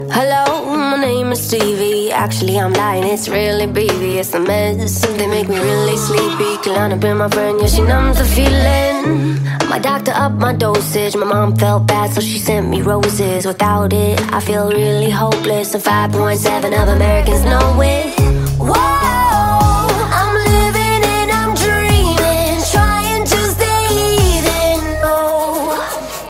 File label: ringtone english ringtones